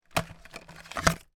household vacuum cleaner upright attachment remove; sound effects one